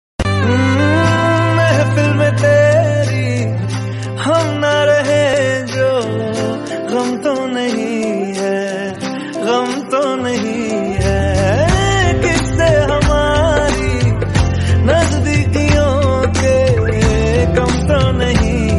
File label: song ringtone best punjabi hindi ringtone